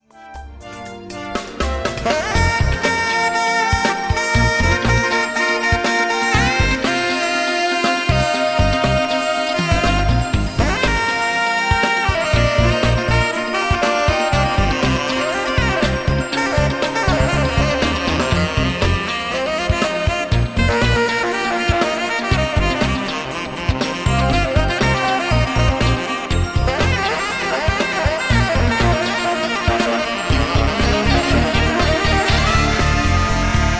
sax

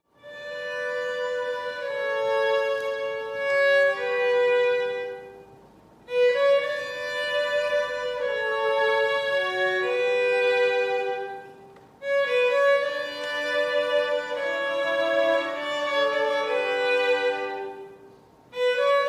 ensemble; classical musicians

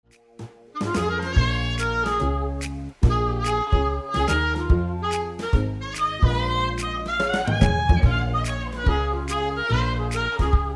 sax jazz

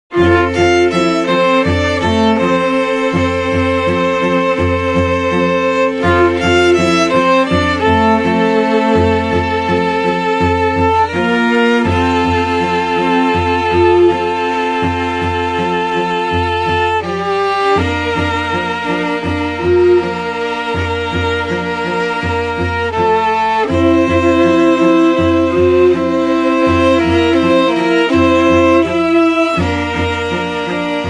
strings

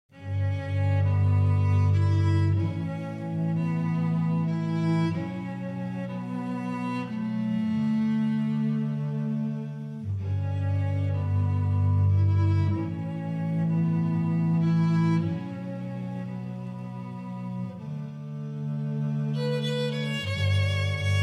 ensemble, String Ensemble; classical musicians

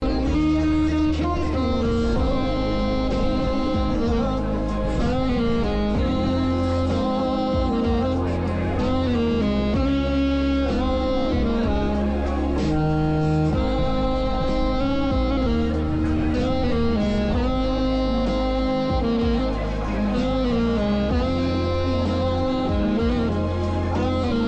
sax

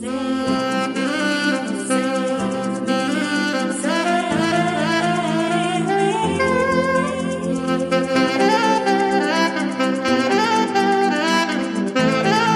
sax

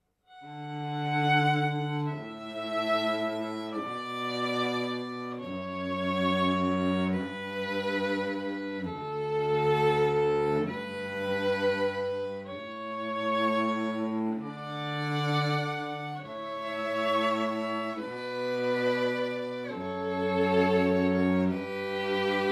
ensemble; classical musicians

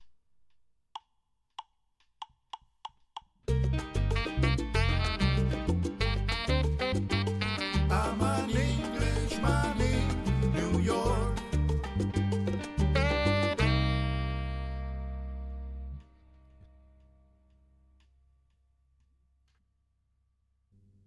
latino

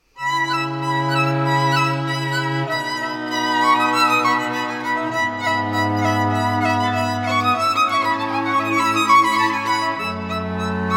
strings; classical musicians

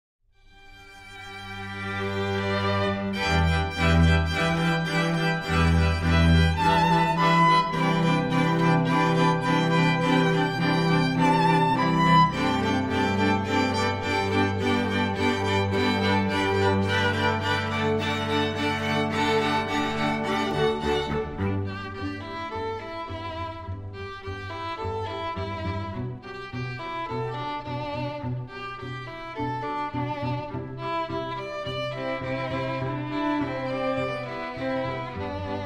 strings

